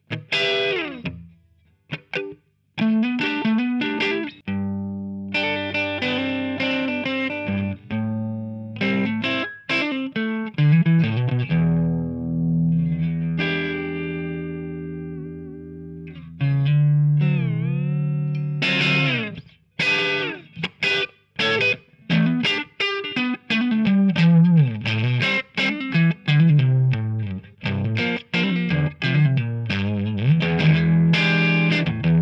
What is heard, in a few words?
Proto2 Crunch CL80